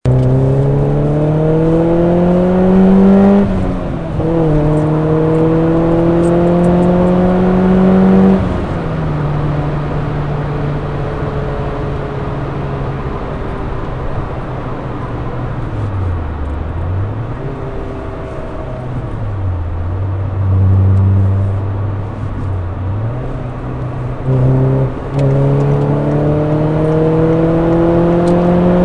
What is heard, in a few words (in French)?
micro a l avant